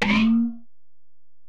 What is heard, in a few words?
Tom, Alesis DM-PRO